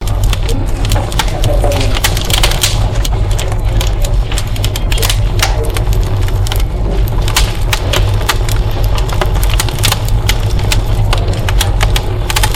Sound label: Loopable Fireplace